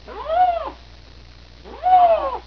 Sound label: canadian lynx; worldofcats